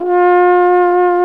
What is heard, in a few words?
tenorhorn F2; BRASS; Best Service; Alpine Volksmusik